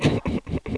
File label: Unvoiced grunt like male